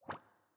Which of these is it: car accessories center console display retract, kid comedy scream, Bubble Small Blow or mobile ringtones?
Bubble Small Blow